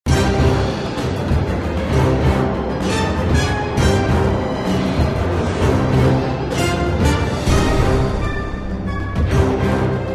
music Finalboss fight

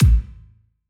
Retry Jingle; Friday Night Funkin Doomspiration ost mod windows gamerip 2022

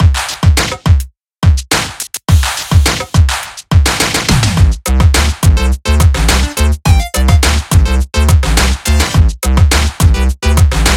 Menu; Legend Bowl

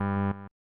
Chance Game Incorrect; pro mahjong kiwame 3; snes

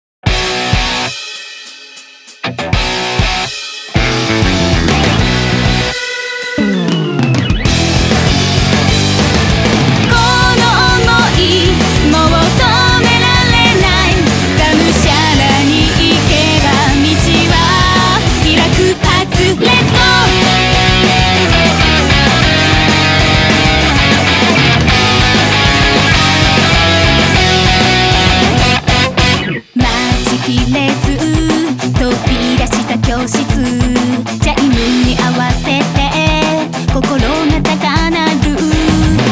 vocal

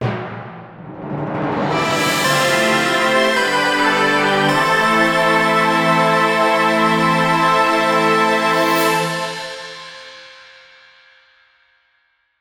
boss killed; heroes of hammerwatch

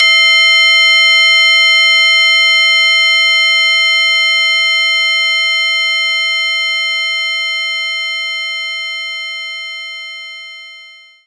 Carillon

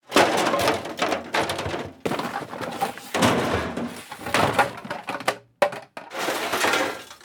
rummaging junk loop

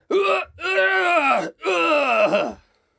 death; hollow knight

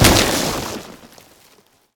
icehit break; Nut Boarding

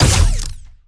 Gunauto Plasmid Machinegun Single

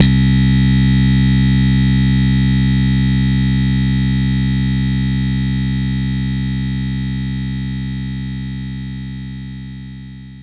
slap bass C2

